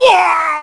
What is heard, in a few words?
Barbarian Death